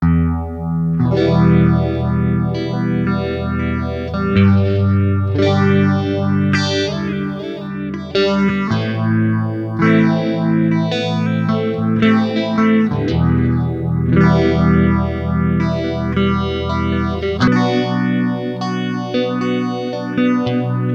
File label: Little Phaser